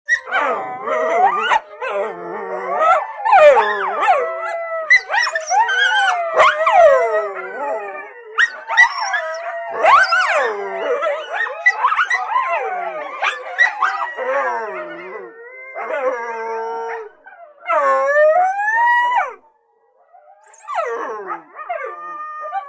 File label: Coyote Howls, Barks. Group Howls, Yelps And Barks. Medium Perspective.